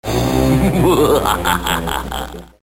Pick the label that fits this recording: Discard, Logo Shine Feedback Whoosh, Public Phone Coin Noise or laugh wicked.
laugh wicked